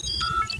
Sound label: Panel On Beeps; Star Trek; TNG